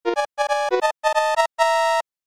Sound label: Time Up Warning Music; SMAS; Super Mario Bros.,Lost Level